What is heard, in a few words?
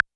Side Stick Ana, YAMAHA RM1X